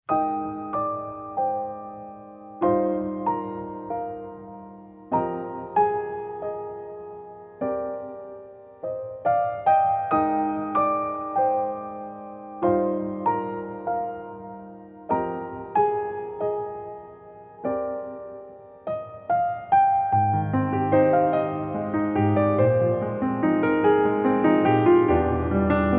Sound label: Piano Septet